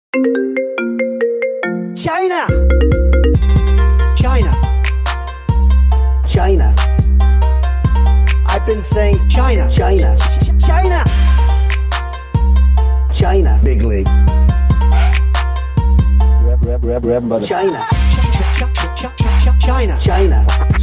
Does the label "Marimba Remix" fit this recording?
Yes